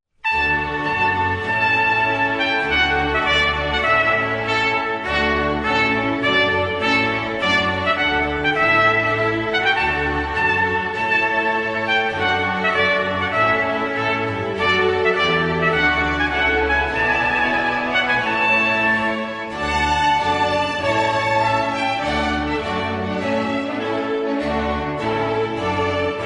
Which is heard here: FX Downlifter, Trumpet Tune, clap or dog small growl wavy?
Trumpet Tune